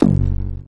adjusted kick; Kicks; single hits

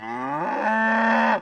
moo alarmed, cow; Cow Construction Kit

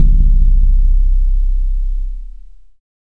Timpani C2, Yamaha DX100; DRUM MACHINES

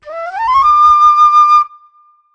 flute shot; single samples

